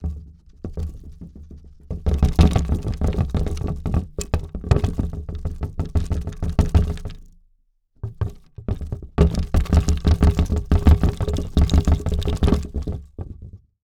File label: Foley Wood Rattle Fast Earthquake